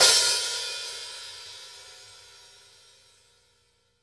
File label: OPEN WIDER, Drumworks; Sampleheads; New York City Drumworks VOL-1